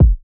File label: Kick